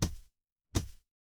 retro game hit

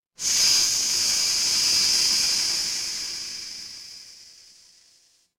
mechanical machine

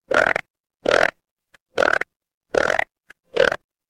animal frog

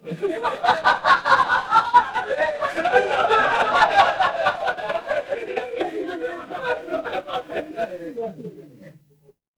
crowd laughter

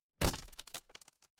impact crate break

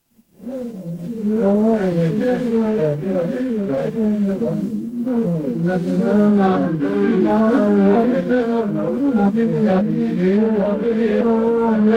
crowd gasp

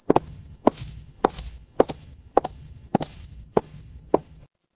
footsteps concrete

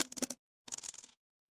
impact dice roll

